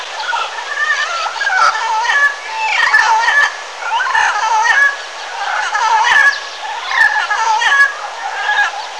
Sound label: gurgle duet